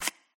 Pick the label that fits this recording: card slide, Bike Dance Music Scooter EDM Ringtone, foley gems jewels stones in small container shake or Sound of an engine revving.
card slide